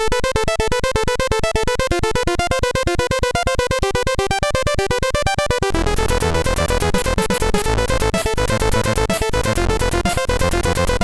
sid MOS6581R3

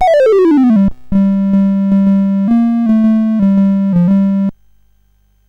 sid, CSG8580R5

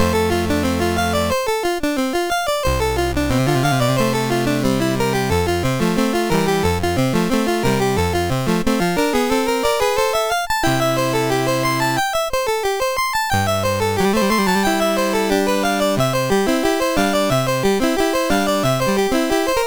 Prelude in F Major, MOS6581R3; Op28 No23

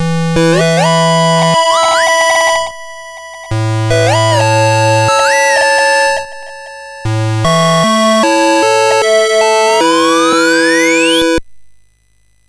Musicbox, MOS6581R3; hvsc

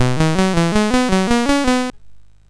sid MOS6581R4; Cave Climber

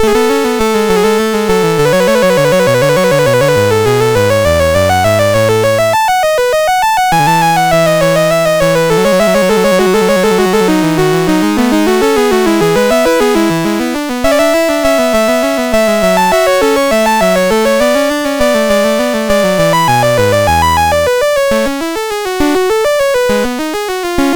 Two Part Invention, MOS6581; MOS6581R2

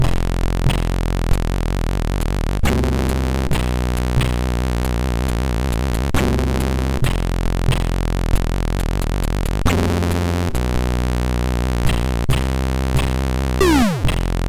sid MOS6581R3

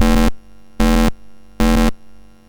sid, MOS6581R2; Donkey Kong 1983

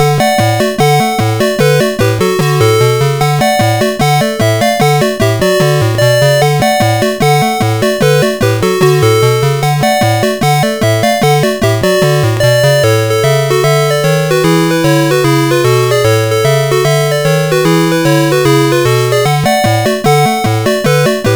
Musicbox, CSG8580R5; hvsc

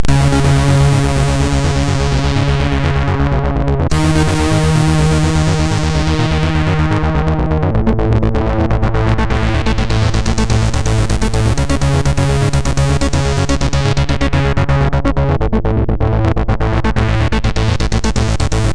sid MOS6581R2

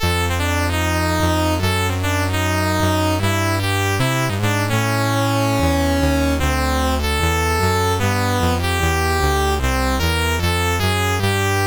Big Band Tune, CSG8580R5

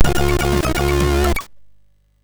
Jumping Jack, MOS6581R2; Andy

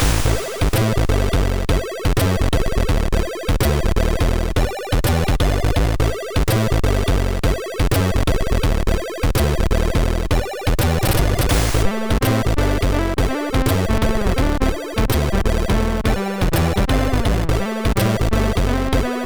Propeller Plane, MOS6581R3

